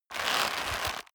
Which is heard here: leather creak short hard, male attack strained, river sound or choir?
leather creak short hard